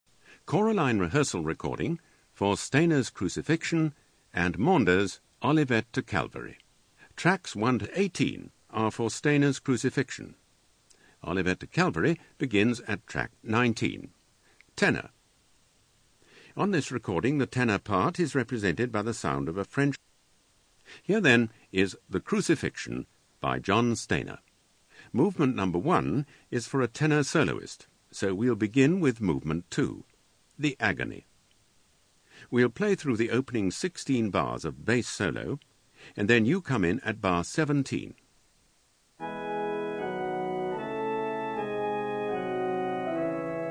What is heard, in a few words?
Tenor; Stainer Maunder Cruc Olivet